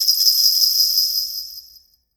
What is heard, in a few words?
Jpn Suzu bells